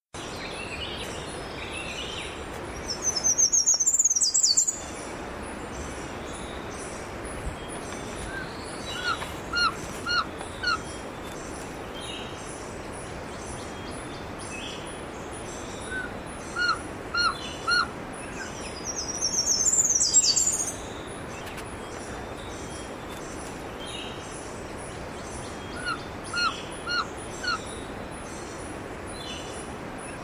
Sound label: Trogon song